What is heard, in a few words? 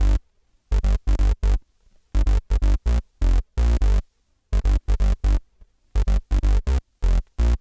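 126 Pop Synthbass G major; Digidesign; Pro Tools; Pro Tools Essential Loops; Pop Rock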